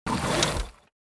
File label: Sci fi gizmo mechanical movements